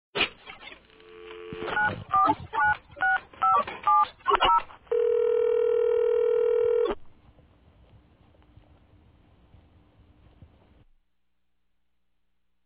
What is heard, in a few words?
Phone Dial